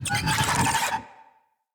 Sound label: Infested Big Ashen Vocal Pain; Enemies